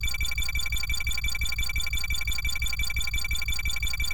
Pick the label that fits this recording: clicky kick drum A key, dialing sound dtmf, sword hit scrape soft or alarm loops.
alarm loops